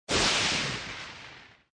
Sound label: Ball Shot